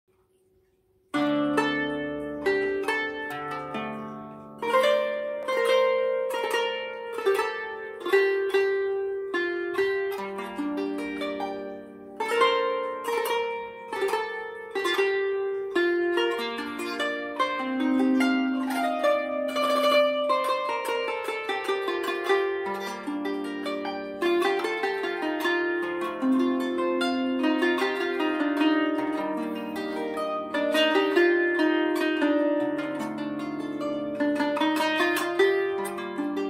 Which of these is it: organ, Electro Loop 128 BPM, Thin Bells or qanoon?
qanoon